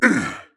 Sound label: Grunt Jump; Lazarus; Paladins